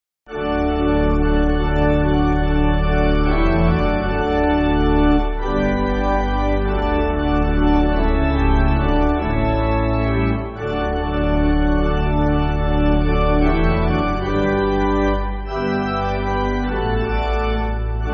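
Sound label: Pipe Organ, Backing Files for Churches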